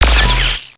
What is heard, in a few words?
laser impact